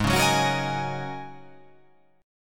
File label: G sharp 9th Suspended 4th G sharp